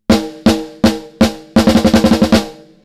snare; Joe Chiccarelli

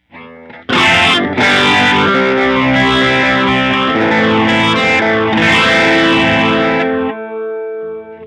Moogerfooger MF-103S Disco Brass; preset 1977

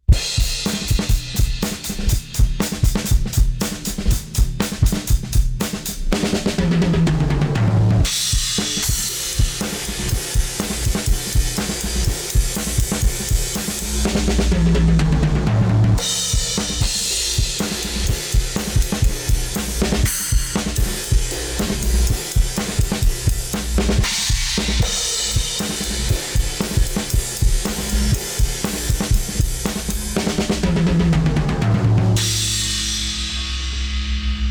Drum Bus Washed Out Beat; Unism

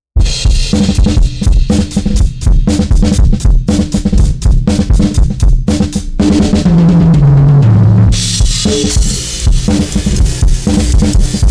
Lindell 6X-500 LOW BOOST

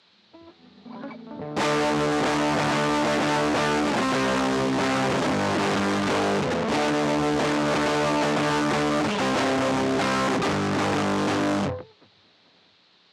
Fuzzy belly; MTurboAmp

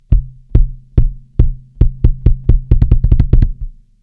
bass drum bass middle

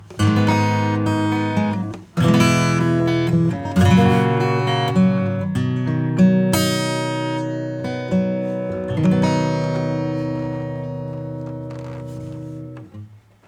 Acoustic Preamp

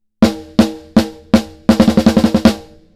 ROLL OFF Reverb 80Hz; Seventh Heaven Professional

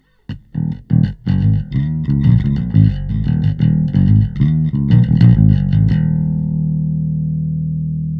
bass; Nanopulse; Push

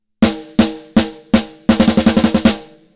Drums Snare Top UnMuffler; Kelvin

